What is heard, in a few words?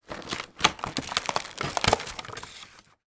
box chocolates open; sound effects one